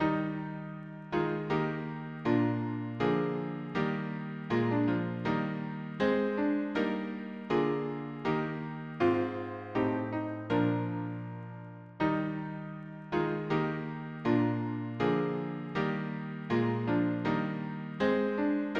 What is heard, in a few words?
harmony piano